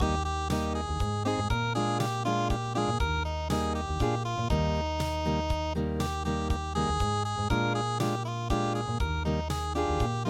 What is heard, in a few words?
unpluged